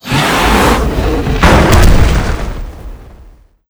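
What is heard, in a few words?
boss death, monster; sinhead; lava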